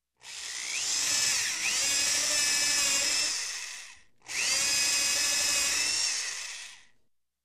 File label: Hand Drilling a Wood